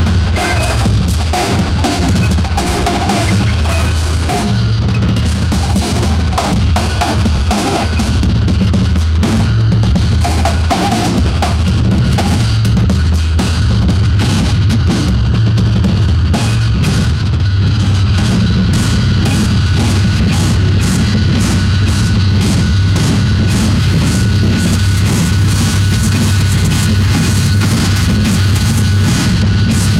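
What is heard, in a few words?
industrial metal breakdown; emo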